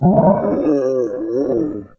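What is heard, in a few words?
scream; The Elder Scrolls III - Morrowind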